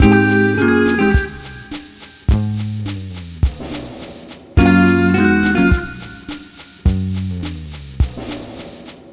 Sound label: Multi Guitar Bossa Mix-G#, 105; Zero-G Kocktail Kollection; Construction Kitz (Lounge)